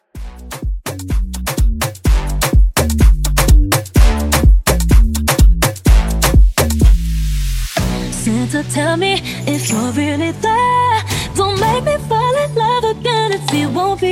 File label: Christmas Kuduro Mashup